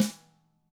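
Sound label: DW HIT, drums, snare; ILIO; Double Platinum Drums 1; DW SNR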